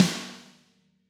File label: Snare Hard